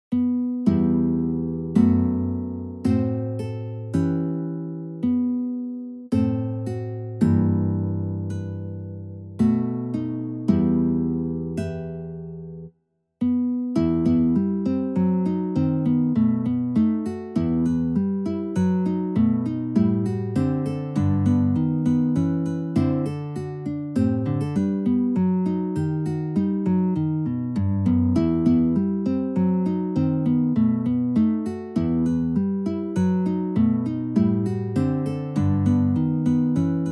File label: Solo